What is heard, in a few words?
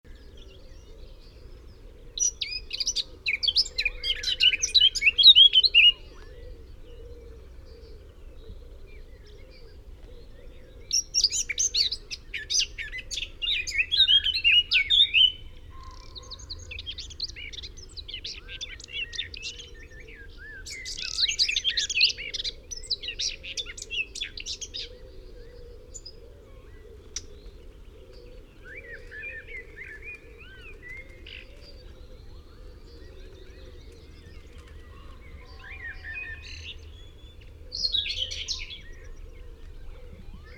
Sylvia atricapilla; SETE